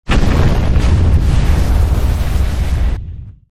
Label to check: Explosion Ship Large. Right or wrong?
Right